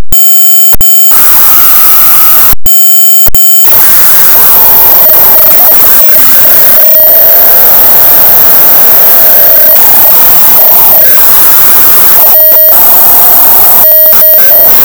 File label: donkey 1bit